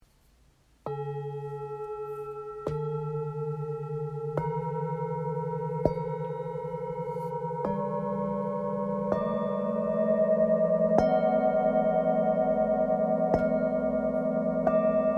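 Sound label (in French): Set 7 bols bengale full moon, bol chantant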